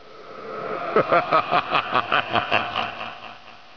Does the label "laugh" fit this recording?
Yes